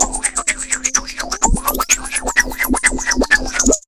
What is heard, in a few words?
126 PERC, PERC LOOP, Fresh Disco House; USB Soundscan vol.20 - Fresh Disco House I